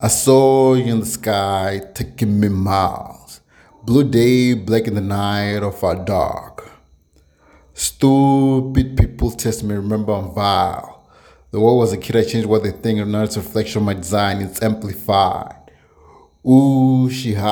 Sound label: Bubblegum Rap